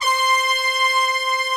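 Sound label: brass tutti, Brass Tutti octave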